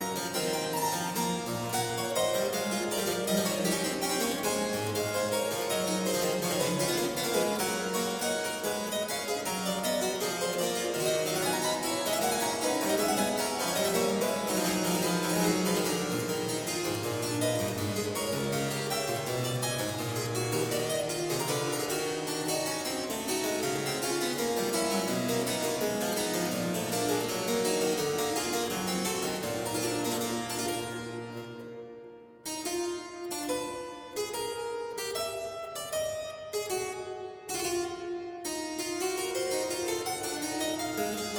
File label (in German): partita e moll gigue; bwv 830; clavier buechlein fuer anna magdalena bach 1725